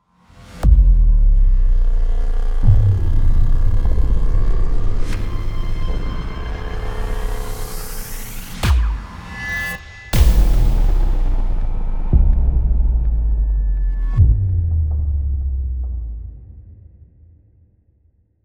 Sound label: doomsday laser cannon long